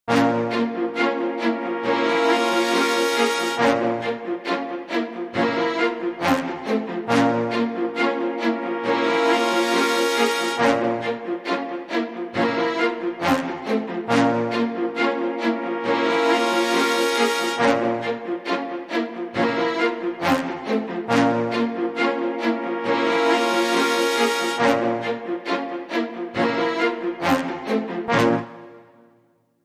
String & Horns Agitato Loop

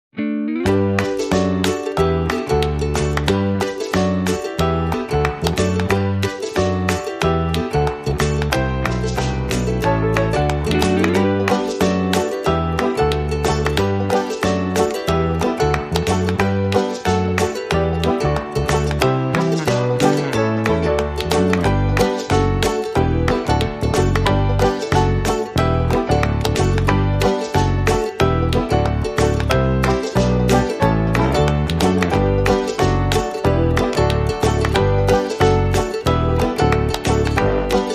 Light Mix No Melody

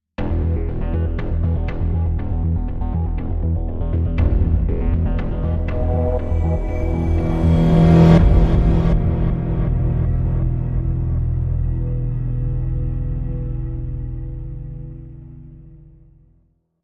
Dark Drone, Bumper

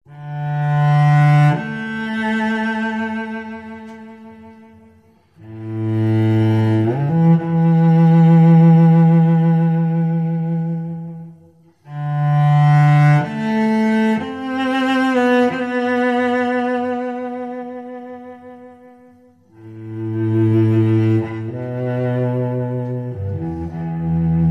Melancholy Cello

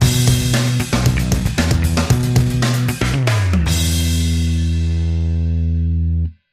Sting

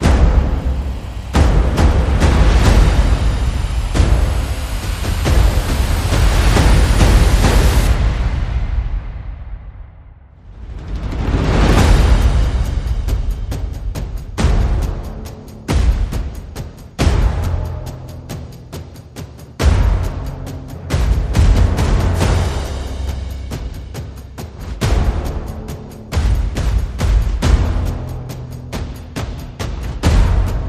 Percussion and Effects

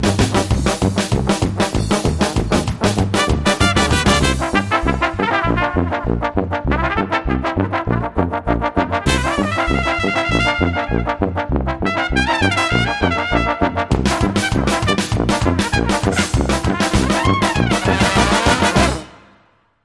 Bavarian Ska, Trumpet Ending

